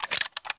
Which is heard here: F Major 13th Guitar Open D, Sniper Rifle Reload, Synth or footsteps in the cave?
Sniper Rifle Reload